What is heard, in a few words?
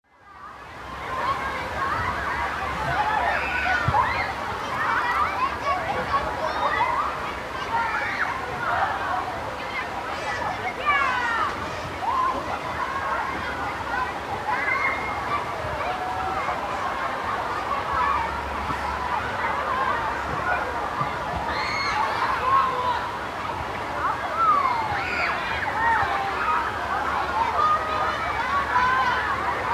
children in open air pool